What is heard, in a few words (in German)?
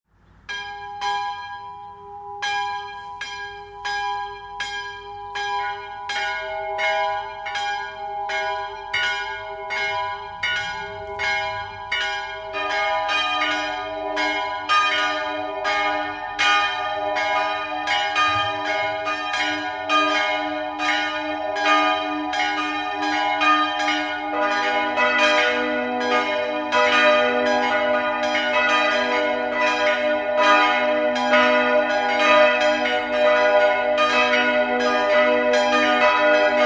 glocken; Neuses